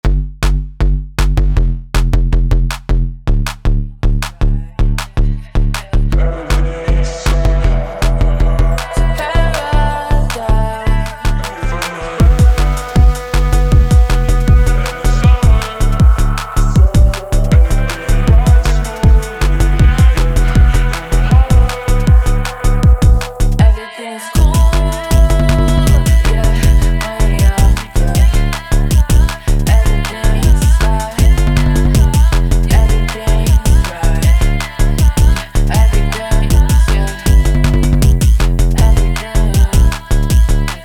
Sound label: BASS